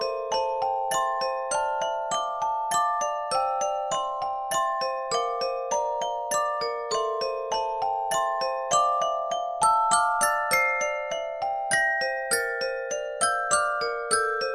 Waltz

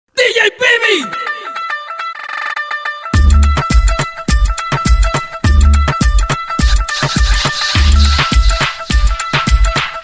Dembow Arabe

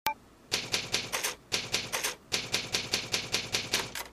Typewriter

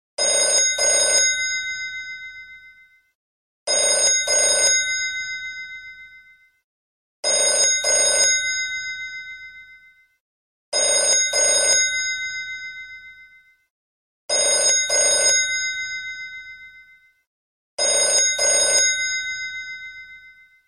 Atm Ringer

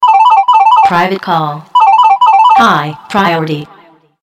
Private Call Ring

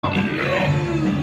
Burping; Fat Thor

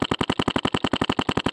paintball gun

